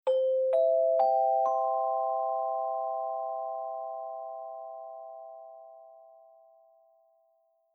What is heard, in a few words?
pinponpanpon